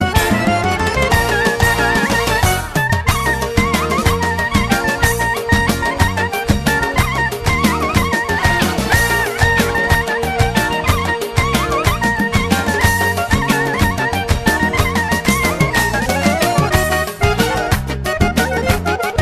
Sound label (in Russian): armyanskij tanec